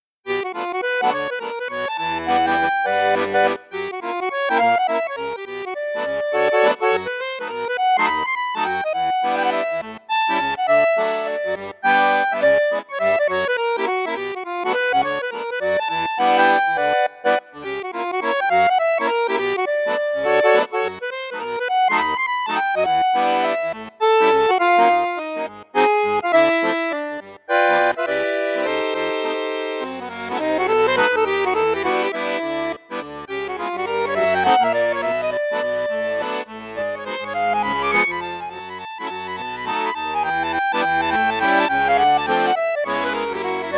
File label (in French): registre basson flute juste, saltarelle accordeon